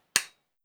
Light Switch Click On

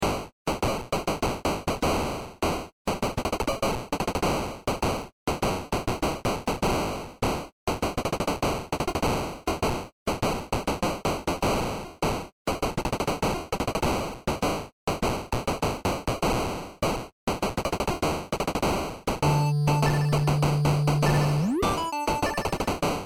pixel clouds and thunderstorms

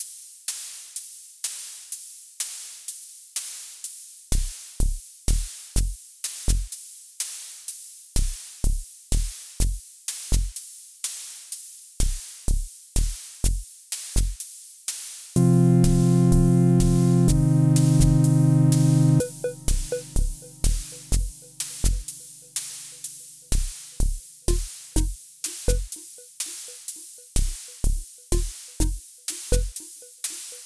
Caves

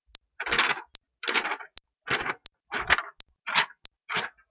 paper pages

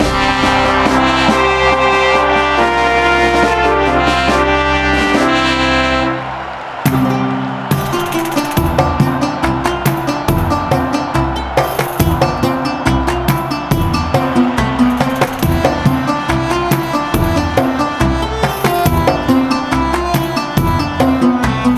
victory

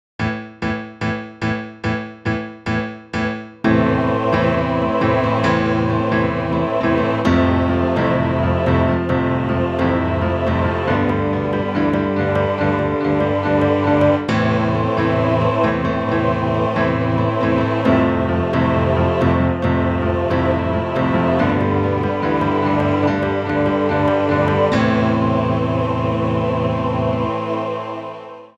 credits, Credits Music

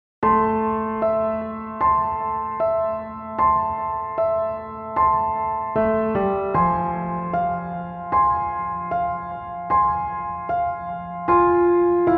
haunting piano

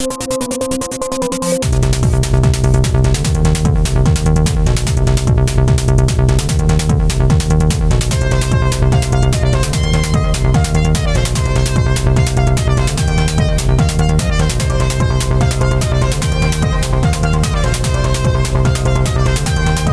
battle

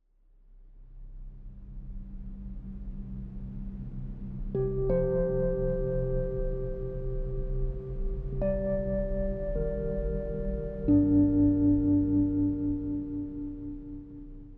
Seashore Peace - Ambiance

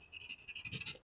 pen scribble, writing scribbles

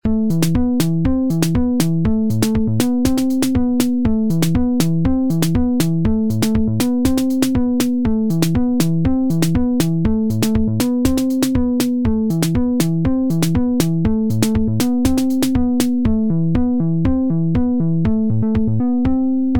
Disco Tune

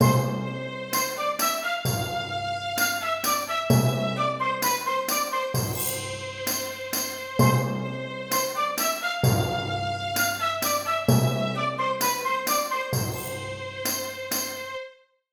medieval fair loop

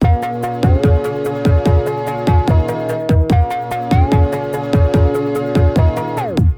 soft beat loop

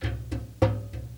ammo loop

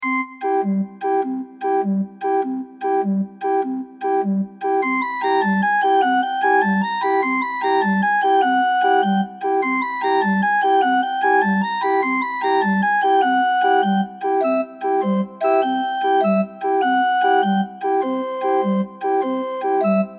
Organ Box; Beansjam

